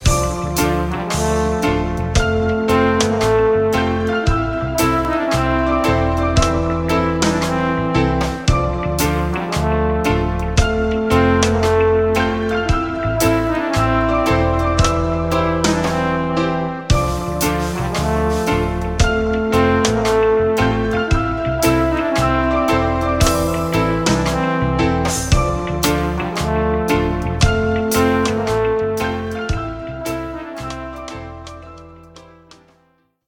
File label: Tropical Groove